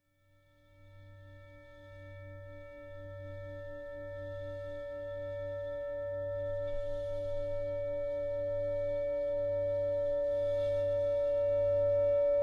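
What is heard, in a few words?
singing bowl; singbowl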